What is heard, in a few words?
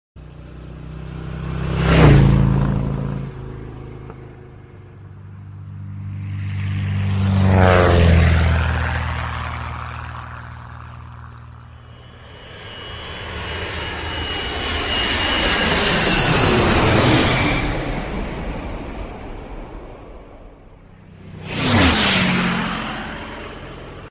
3 D Sound Mix